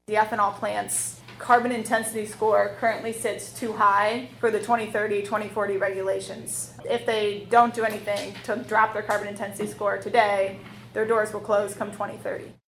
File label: Pipes